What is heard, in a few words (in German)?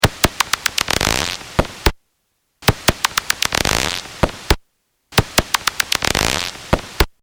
Abendsegler Feeding Buzz heterodyn